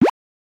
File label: bubble click; crazy balls